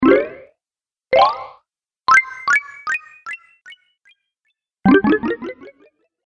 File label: Boost Health, video game; Boost Health Collection; Video Game Sound Effects